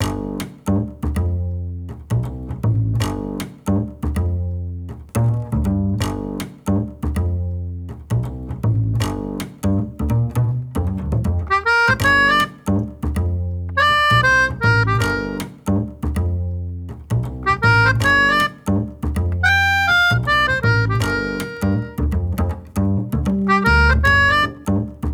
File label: Bluesy Cafe